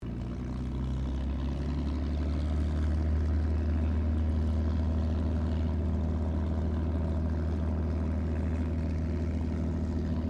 AIRCRAFT HAWKER SEAFURY TAXI SHUTDOWN DISTANT STEREO, fighter-bomber; Airplane Sound Effects